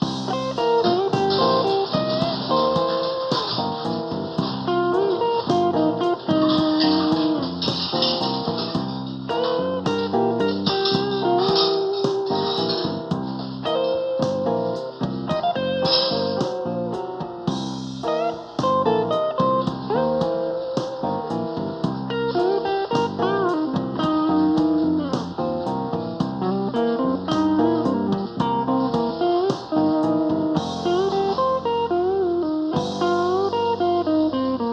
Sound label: Casino Blues; Incredible Adventures of My Mom; Kitchen